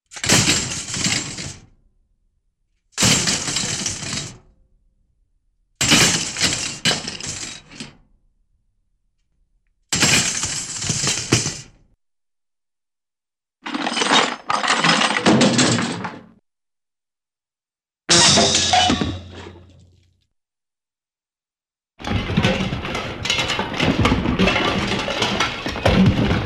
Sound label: Metallic Impact, cartoon; Hollywood Edge; Cartoon Trax